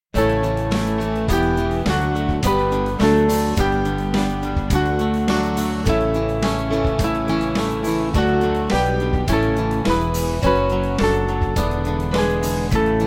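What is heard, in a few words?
Music Backing Files for Churches; Lo God Is Here; Old 117th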